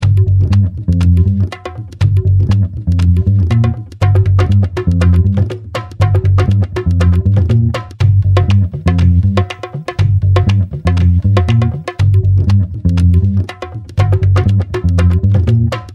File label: funk indian